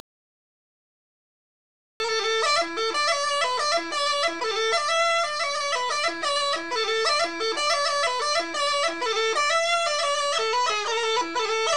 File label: Ruchenitsa Y Line#4, Gajda; Lesson Recordings; Vasil Bebelekov (2008) Gajda Lesson